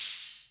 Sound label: Hihat Open Dizzy